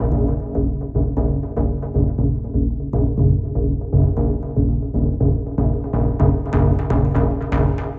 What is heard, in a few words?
tiny village; adarkroom